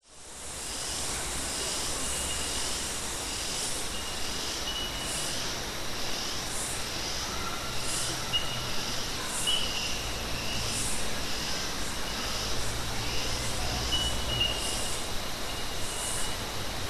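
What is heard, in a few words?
nature ambience campsite summer forest insects cicada voices distant waterhole vehicle; austral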